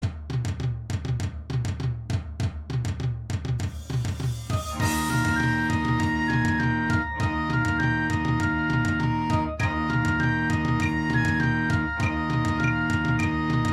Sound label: Sherwood Forest Practice Tempo E Flat